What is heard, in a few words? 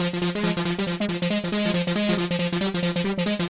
Synth Keys 138bpm